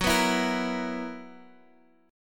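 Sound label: B Major 7th Suspended 4th, G flat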